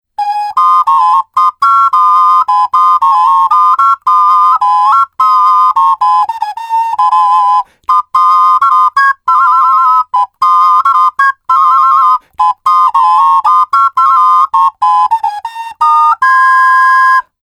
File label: Soprano Duet Spring, ocarina